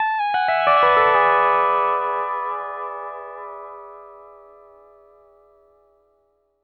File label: LOOPS 120, Whole Lotta Country